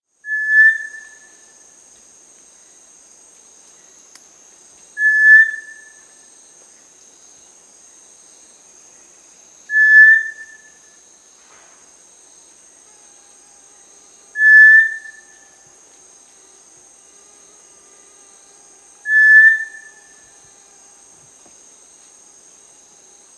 Cinereous Tinamou, Crypturellus, Nature's Songs, S. America